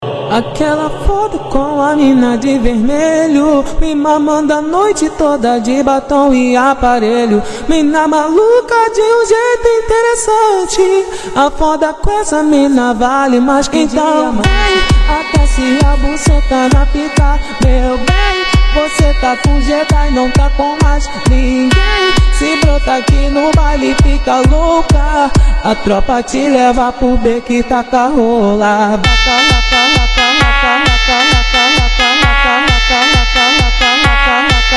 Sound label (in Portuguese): baile funk